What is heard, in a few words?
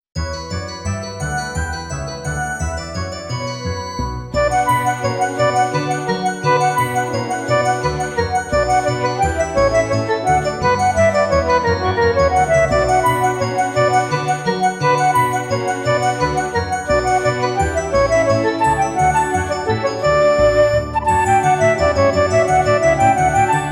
Warm up